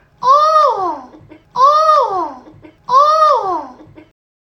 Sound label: Eagle